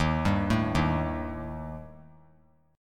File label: D sharp Major Flat 5th; arpeggiated; D#Mb5; 15,14,12,13; JGuitar